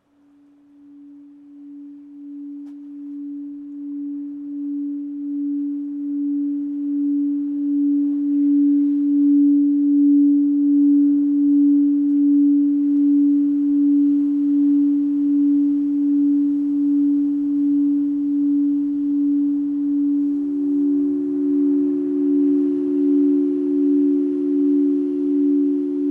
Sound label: Crystal Waves, crystal singing bowls